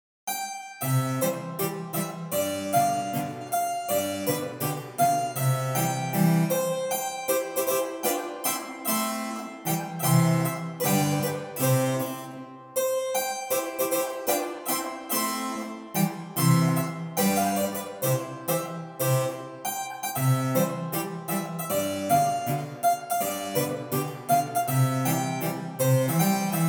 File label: harpsichord, Authentic reproduction of a classic harpsichord; CineHarpsichord; Cinesamples